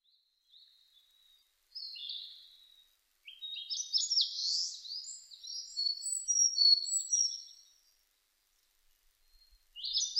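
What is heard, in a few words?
Sakhalin leaf warbler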